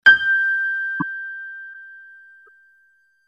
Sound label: piano; pictcode